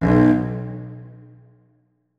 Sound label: notes, keyboard; Hideout Furniture